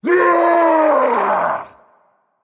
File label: battlecry berserker; cuchulainn